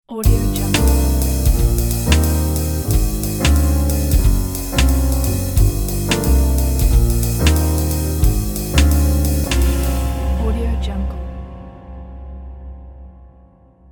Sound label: jazz me